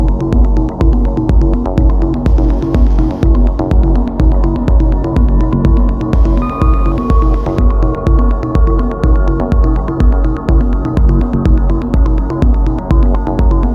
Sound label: Binaural